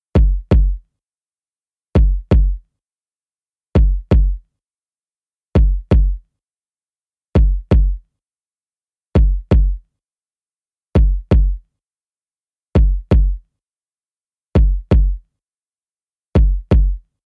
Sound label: Groove